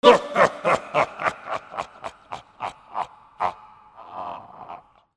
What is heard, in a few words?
CARTOON